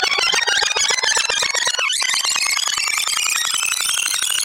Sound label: Rewind Ringtone